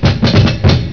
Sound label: Shave And A Haircut